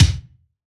BD, BASS DRUMS; EdgeSounds

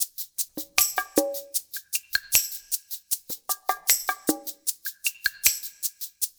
LOOP, Percussion

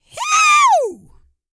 YELL, MALE HUMAN; Spectrasonics Vocal Planet

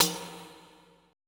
TAMB

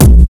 DUTCH KICK; KIK8+9

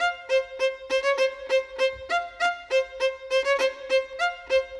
Violin; Sonic Foundry (Sony Creative Software); Orchestral VOL-2; Modern 06

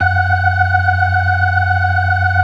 BLOOD OCT R, ORGAN

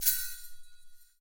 METAL; ILIO; Ethno Techno; BEDROCK 126

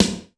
Korg 01W Dance Kit; 300 Drum Machines

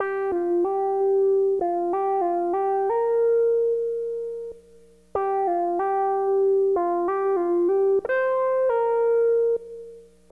SYN, Hip Hop and R&B; Best Service ProSamples vol.02; AKAI

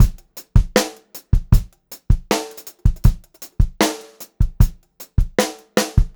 Funk-Groove Drumloops, 78 STRVAR; USB Soundscan vol.38; AKAI

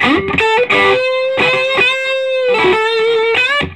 Vintage Blues Guitar, SOLO

